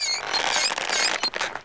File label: curtain